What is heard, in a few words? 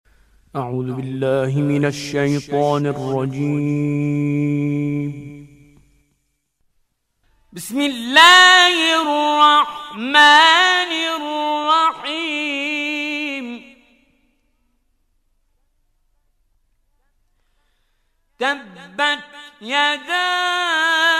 Mujawwad; Mansouri